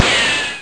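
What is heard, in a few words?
RICOCHET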